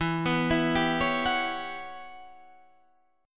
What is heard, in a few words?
E Minor 6th Add 9th guitar chord, Standard; arpeggiated